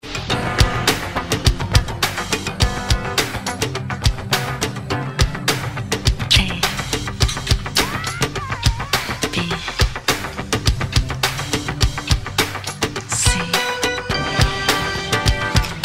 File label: roland d-50